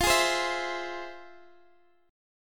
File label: F Minor Major 7th, Guitar, Open D; x,x,15,14,15,14